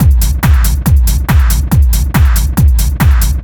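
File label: Hardcore, drums, techno trance; сэмплы ударных техно транс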